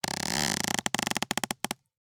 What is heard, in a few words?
CREAK Wood Tree Creak Long Clean; mono; Universal Sound FX